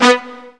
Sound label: STAB HI; jazz jackrabbit 2